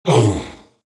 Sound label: Player Damage